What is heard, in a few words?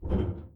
barefoot metal; Apotheorasis